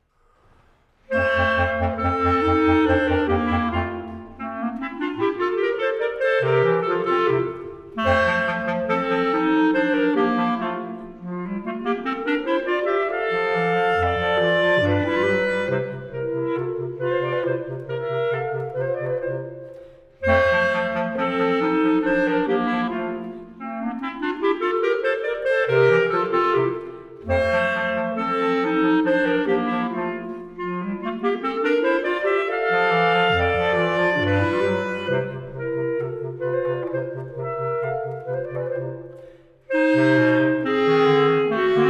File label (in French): Trio Clarinettes; Mozart